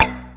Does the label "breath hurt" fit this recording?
No